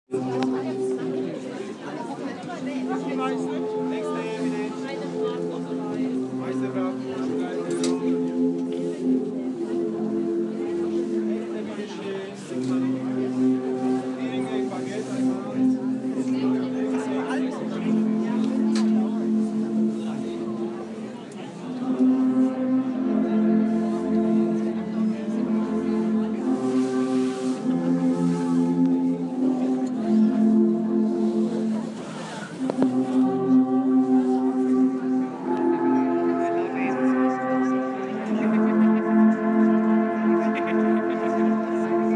horn blowers and christmas market atmosphere in schwabisch hall soundscape